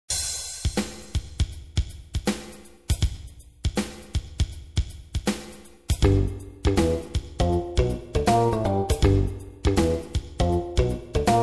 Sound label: funk walk